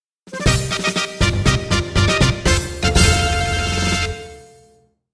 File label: Fanfaare